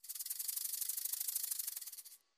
spin Start; hiddencoinsofegypt

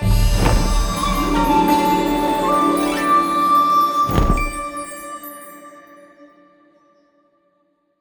wild Spin Total Win; wild bazaar

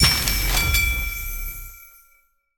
sign Zoom; reef raider

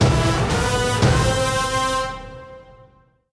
medium Win Autoplay; victorious

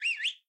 free Spin Intro Whistle; robin hood

